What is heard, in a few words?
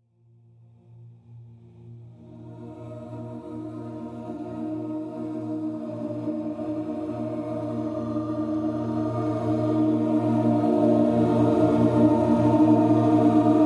Biophotonic Choir